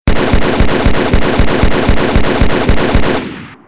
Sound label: Halo Assault Rifle